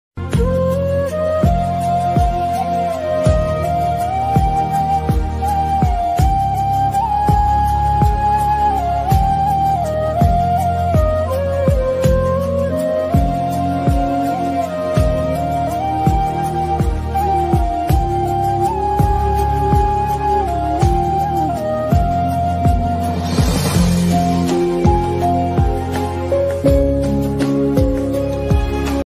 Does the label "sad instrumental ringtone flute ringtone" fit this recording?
Yes